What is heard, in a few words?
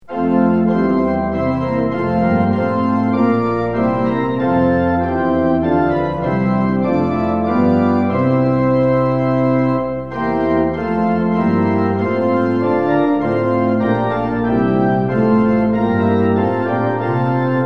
tune; hymns; lillenas